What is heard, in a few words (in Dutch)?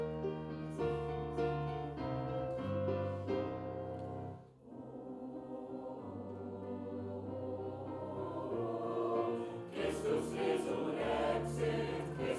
taize koor+tegenstem